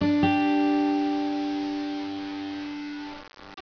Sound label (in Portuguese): guitarra